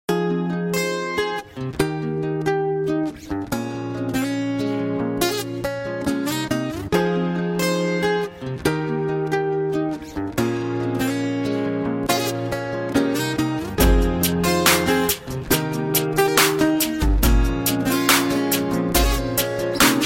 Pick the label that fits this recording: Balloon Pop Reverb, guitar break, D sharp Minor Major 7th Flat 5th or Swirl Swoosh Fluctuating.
guitar break